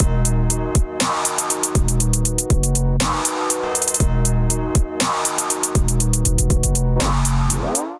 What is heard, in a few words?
Deep Knight groove 120bpm; MIDI Clips; Ableton Factory Packs; Beat Tools